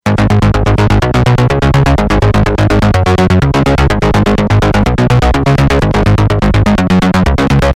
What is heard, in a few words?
Sonic Farm Xcalibur Synth Bass